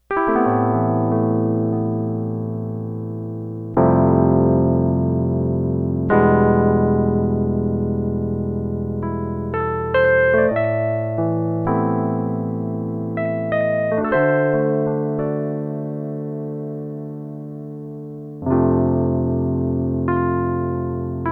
ELECTRIC PIANO

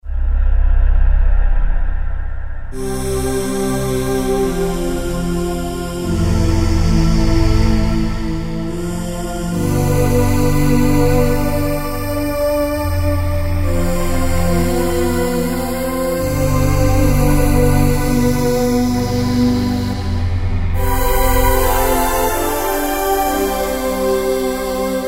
Roland JD-990; Tangerine; Factory Patches examples

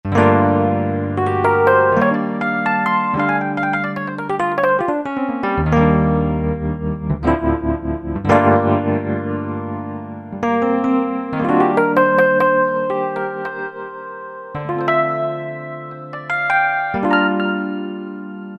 Suitcase, Alesis QS8; Factory Preset examples

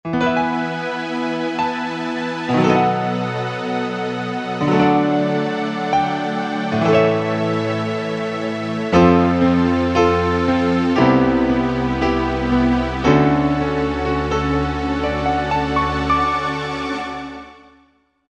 Piano & Strings 8', Korg Wavestation A-D

